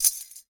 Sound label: sistrum; Kenya